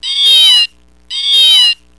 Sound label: cat